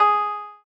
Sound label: Koto Ab5